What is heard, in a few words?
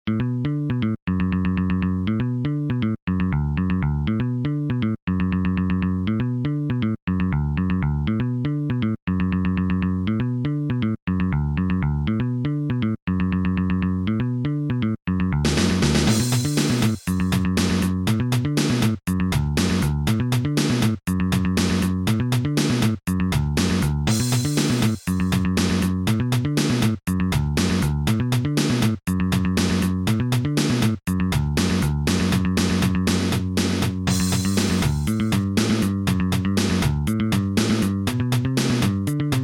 Funky Monk Rock